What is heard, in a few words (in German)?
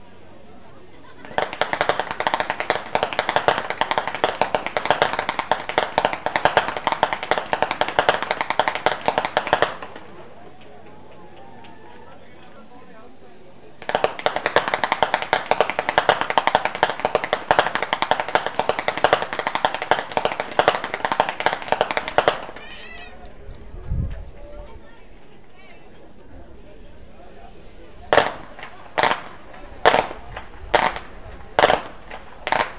schnalzen, flachgau; liefering